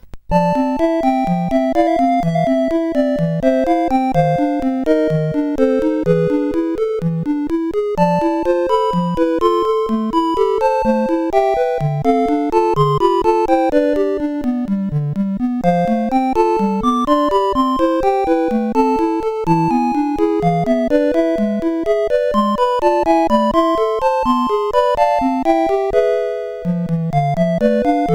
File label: overdrive sid CSG8580R5